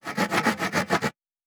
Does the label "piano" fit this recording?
No